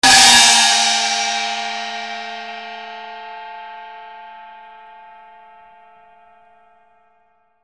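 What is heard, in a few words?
percussion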